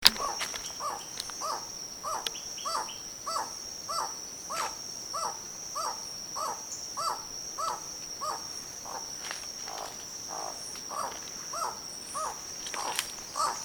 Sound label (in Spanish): tucanete culirrojo aulacorhynchus haematopygus